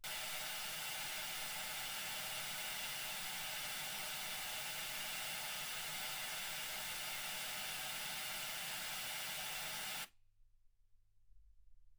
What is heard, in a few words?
wgn 48kHz